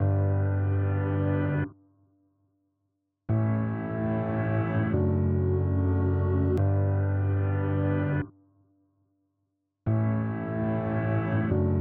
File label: Keys, 146bpm; Remix Stems